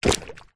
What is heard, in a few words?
balloon filled water medium hit impact wobble; zapsplat